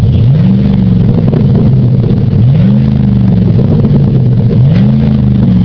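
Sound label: 2007 chevy silverado 4.8l dual extreme, exhaust; Chevy/GMC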